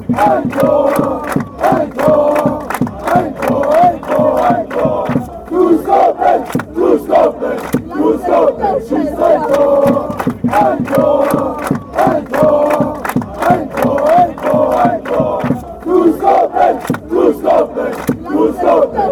a goal, fanchants

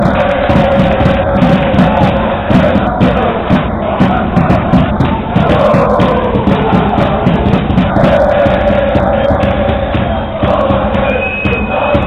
allez vorwarts oh oh oh, chants; stpauli

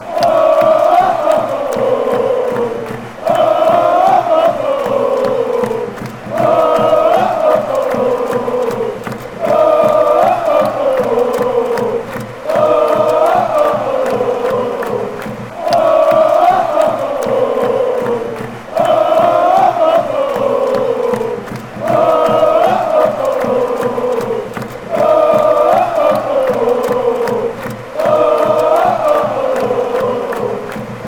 ohohoh, fanchants

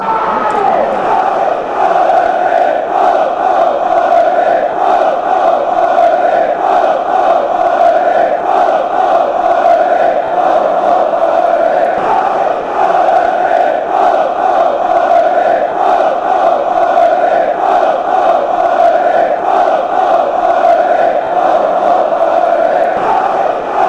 fan chants; v v vfb

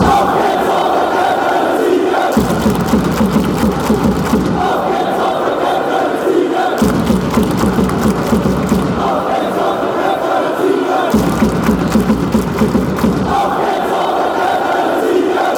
come on fight and win, fanchants

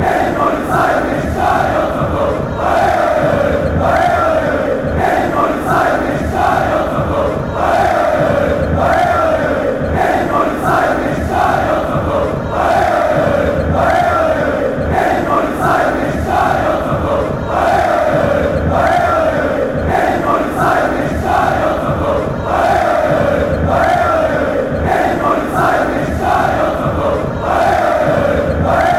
stadium ban fan chants

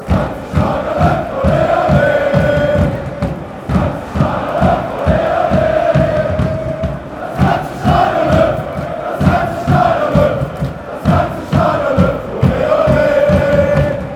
stadium jumps fan chants

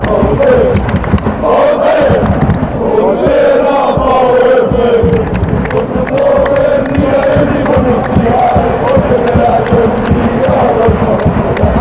ole ole, fanchants

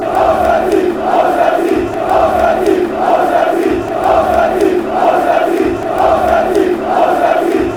away victory, fanchants

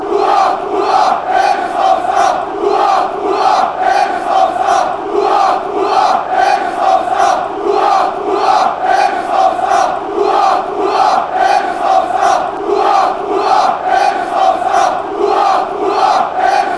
hurrah, fanchants

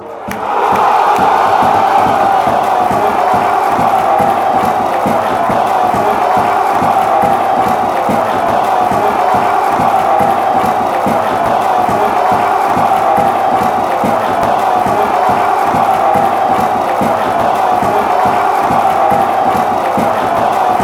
goal, fanchants

